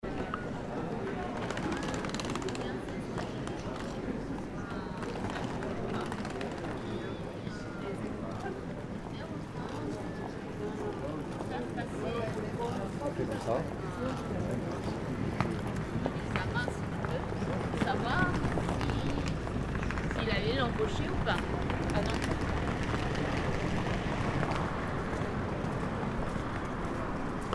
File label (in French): Grande rue vers la gare